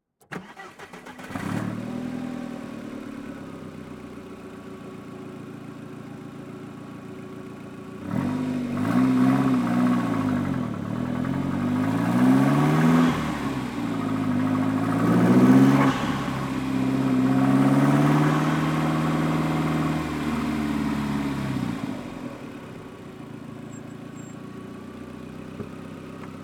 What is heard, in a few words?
Toyota Endurance Race Car ONBRD SLOW Start Drive Stop Reverse Stop Drive Stop, with Audi Engine; Pole Position Production; GDC 2023 - Game Audio Bundle